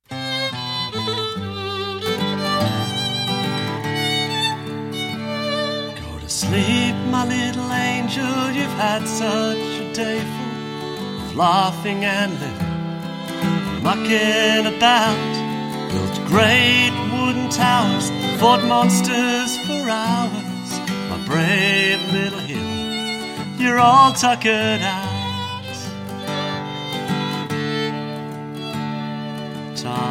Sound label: real world lullaby